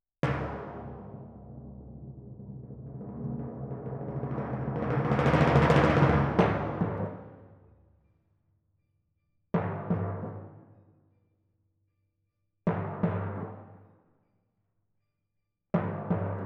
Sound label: Twin F Timp